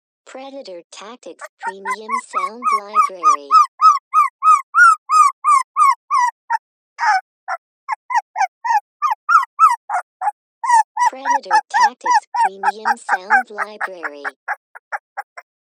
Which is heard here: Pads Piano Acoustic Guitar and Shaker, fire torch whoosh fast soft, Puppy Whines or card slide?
Puppy Whines